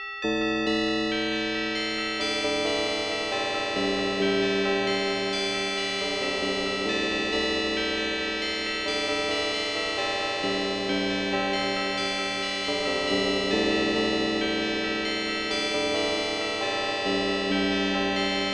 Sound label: tube bell; MIDI Music File